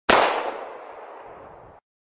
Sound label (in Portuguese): tiros